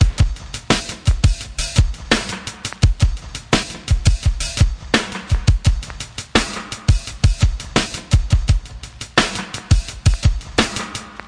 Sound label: 085 bpm huffy compressor break, DRUM LOOPS and BREAKS